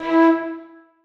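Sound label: flutes staccato d#3; Sonatina Symphonic Orchestra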